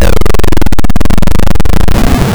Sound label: plummet; Shadow Knights